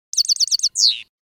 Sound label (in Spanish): chacheada; campero